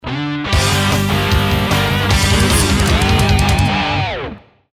hard rock opener

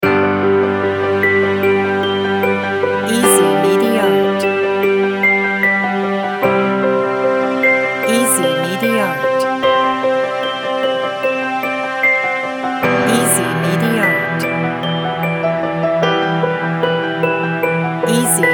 small dream

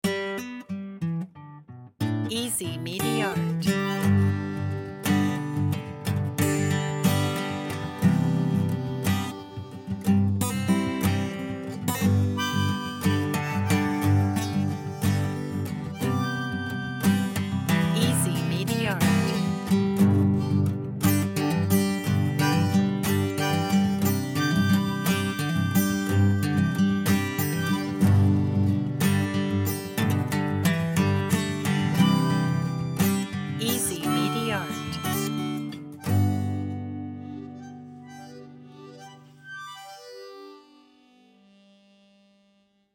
bit of the blues

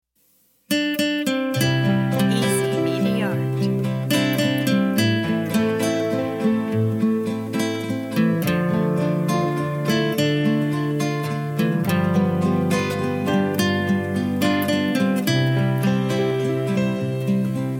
acoustic guitar

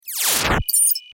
neo arcade movement

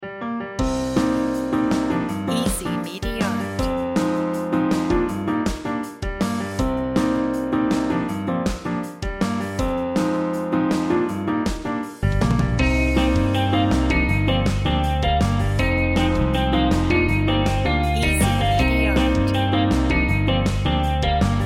jazz

